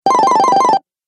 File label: Retro Videogame Fx Level Bonus